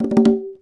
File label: Fill, Conga; percussion; musicradar